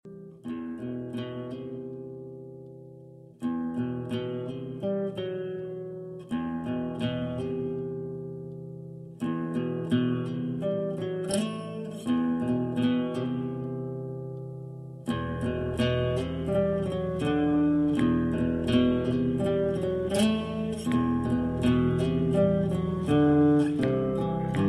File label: Prelude In E minor